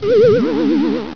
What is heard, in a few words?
gurp; quake2; mnhcoop; battlebrowzer